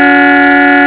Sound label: CAR HORN; Kiddie Ride Sound & Control; Police Car